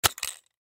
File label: Mouse Trap Snap, Mouse Trap Snap On Mouse; Household; Machines; Blastwave